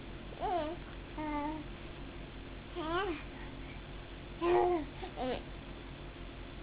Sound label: sounds